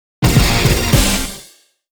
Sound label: Jingle 1; Rannyuu